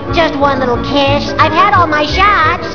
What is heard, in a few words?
kiss